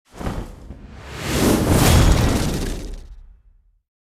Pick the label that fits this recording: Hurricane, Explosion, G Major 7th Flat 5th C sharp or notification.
Hurricane